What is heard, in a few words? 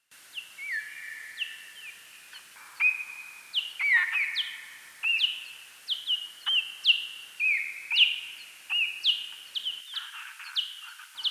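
Eptesicus serotinus, bat; Boehm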